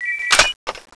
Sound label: reload, weapons; tfa cso